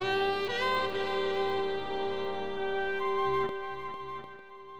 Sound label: HORNBG; HipHop Fx; LiveAct Pool 1